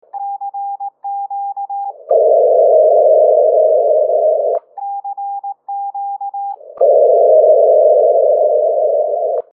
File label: 10ghz echo